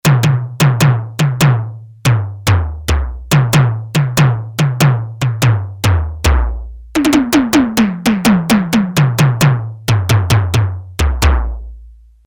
Tom C4, Yamaha TX802; Factory Patches; Voices

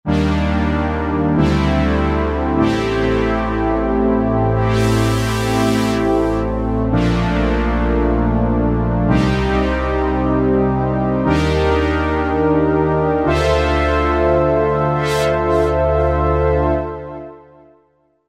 MemoryMG Brs, Vintage Synth; Roland SR-JV80-04

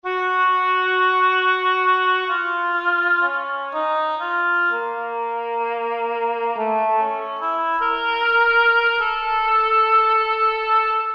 Oboe, Roland SR-JV80-02 Orchestral

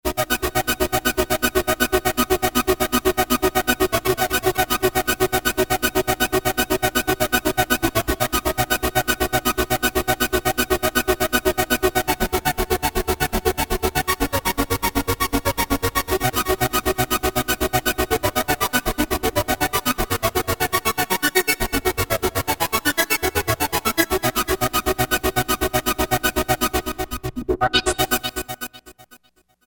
Epic Arp, Novation XioSynth 25

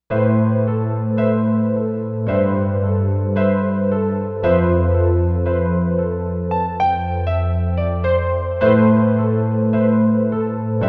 Korg M1Rex, Piano Haven; Factory Patches

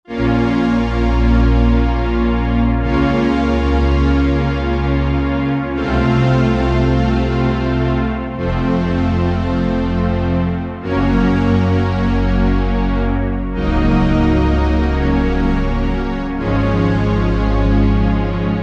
Octapad, Roland JV-2080

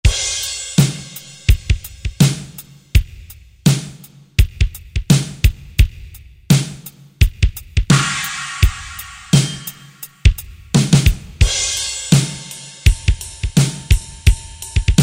GM Kit, Korg 05RW

